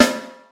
boomy acoustic snare sound b key, snare tuned to the B note; snare drum samples